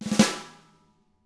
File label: ruff, snare; ILIO; Double Platinum Drums 1; SNARE ROLLS